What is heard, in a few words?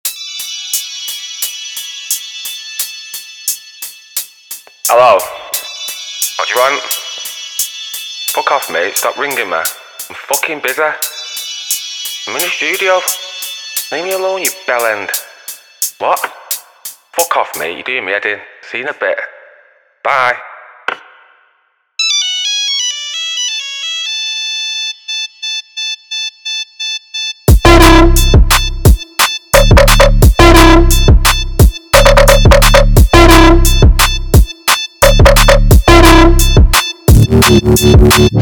Trap Phone, Drum and Bass; DUB